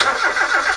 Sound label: engine choke; Sound FX Real Life